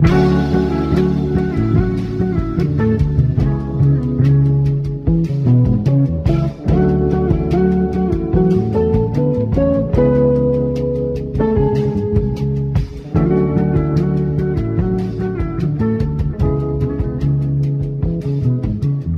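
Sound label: guitar, Loops Phonky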